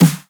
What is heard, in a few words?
Snare Shhh; Bananas93